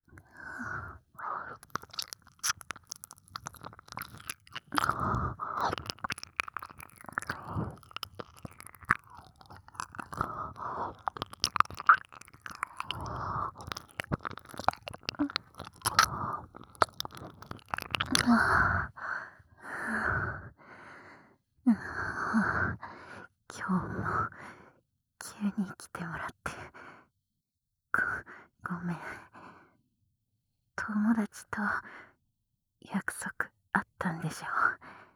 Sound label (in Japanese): 耳舐め, ASMR; 特殊性癖 耳舐め依存のお姉ちゃん